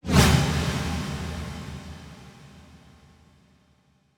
Fast swoosh reverb; 转场类音效

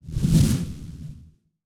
Evil swish; 转场类音效

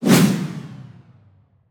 Fast swoosh down; 转场类音效

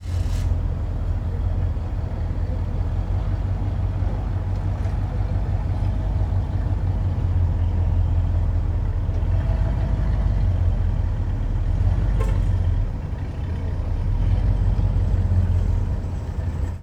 Parking Lot; 环境类音效

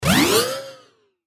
Sound design hit blast energy fast ascend; BLASTWAVEFX; 能量音效

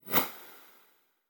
Fast zing; 转场类音效

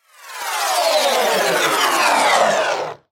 Sound design sweeper energy delay laser cannon; BLASTWAVEFX; 能量音效

